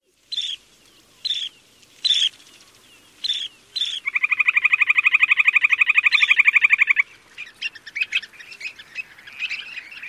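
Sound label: calls, Purple Sandpiper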